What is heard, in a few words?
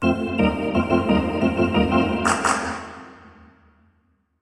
Hockey Organ